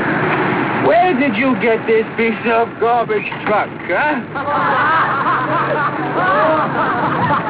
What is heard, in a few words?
garbage truck